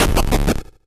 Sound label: Game FX Death; KSHMR